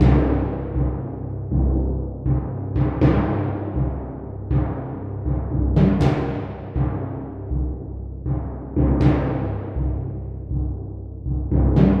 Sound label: timpani; звук для батальной сцены литавры